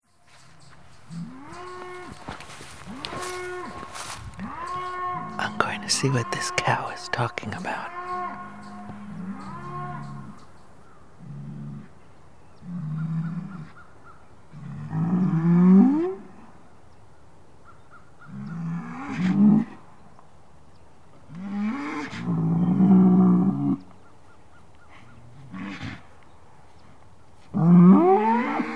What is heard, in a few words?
Two Cows in Conversation; Eagle Bridge NY